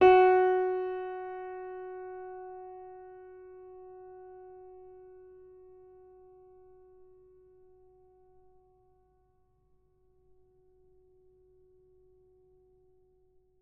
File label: F sharp 5, piano